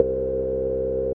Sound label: filtery synth bass